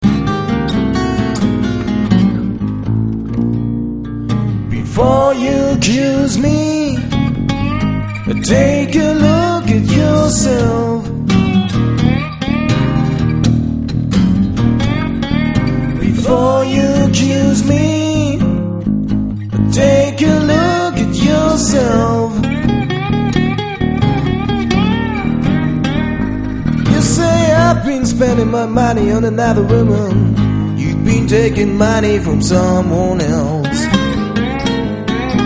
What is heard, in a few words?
fingers